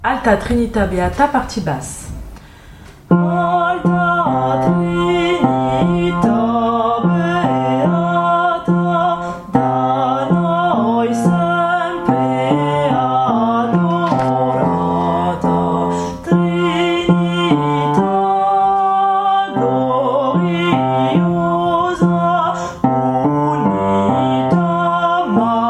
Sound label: Alta Trinita Beata Basse; anomyme XVs